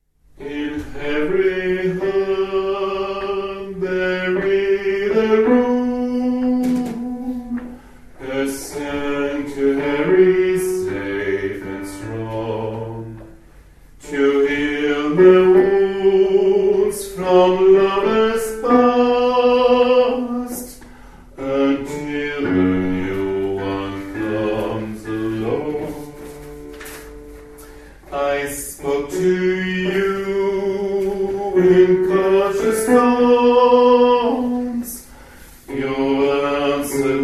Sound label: Basses